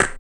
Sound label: RSS PERC